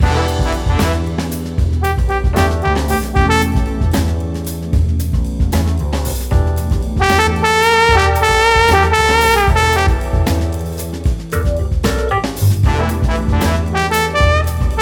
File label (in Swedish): jingel frisk mix